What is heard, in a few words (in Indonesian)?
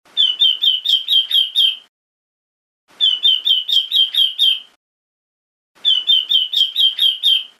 robin betina